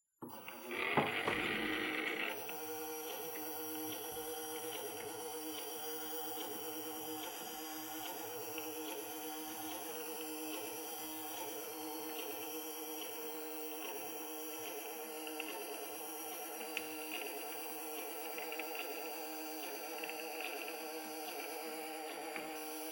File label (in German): dachfenster elektrisch oeffnen mit kettenantrieb